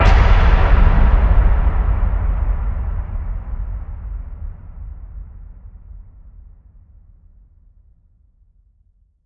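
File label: Hit Ambient Boom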